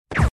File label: Dull Gun Bullet sound